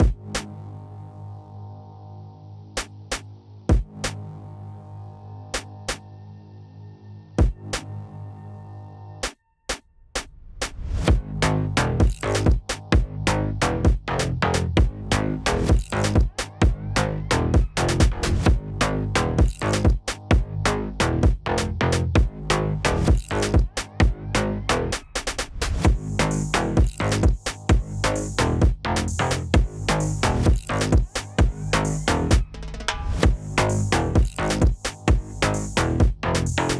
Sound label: Instrumental brazilian phonk